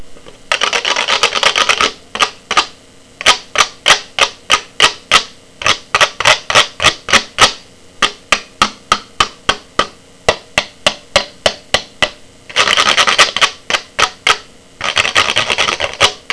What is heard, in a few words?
guiro